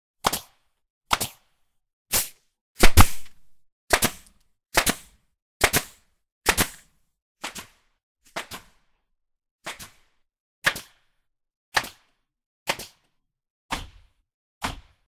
nail gun; میخکوب

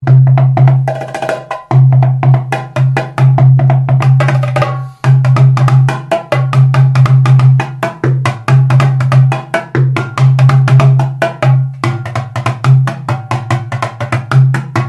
Kassoora, ساز

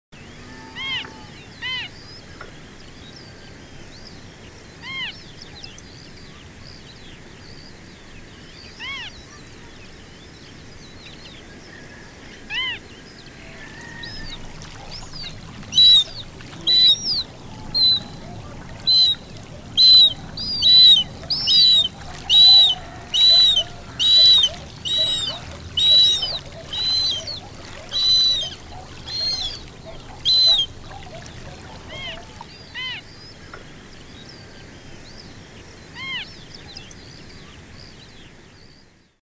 marsh harrier

